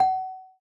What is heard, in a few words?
celesta, note; perdachcraft; minecraft